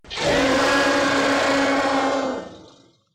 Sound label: dragon death